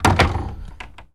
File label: Door Double Wood Close; Doors